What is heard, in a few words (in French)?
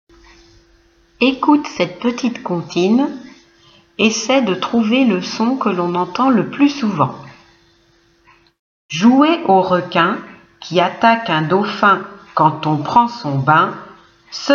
comptine son in; pigeon vole